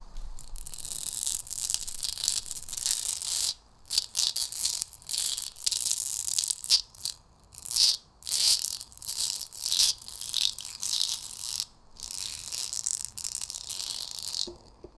a cockroach walking on th, insect

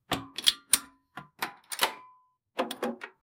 open a metal safe, security

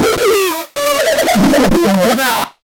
brutal juicy crush

